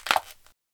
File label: Bone Breaking